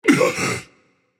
breath; bakasura

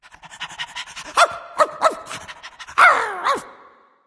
wolf; fenrir

